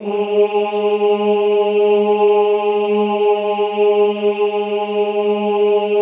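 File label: OOH G#2 left, classical choir; Best Service; Extended Classical Choir; OOH MIX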